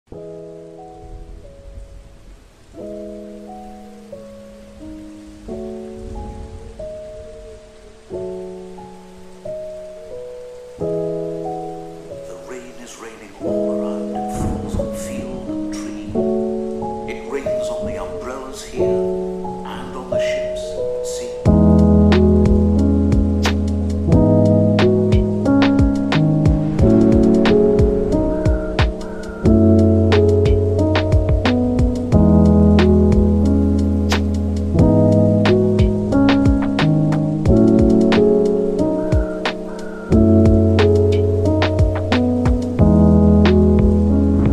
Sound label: Rain and thunder; با موسیقی